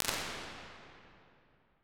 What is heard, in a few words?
Pyramid Room; Medium Spaces; Apple